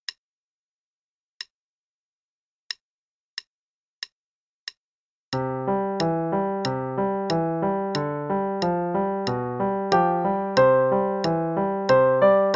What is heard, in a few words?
We Wish You A Merry Christmas (Classical, qn=92), Piano; Play-along; Faber 4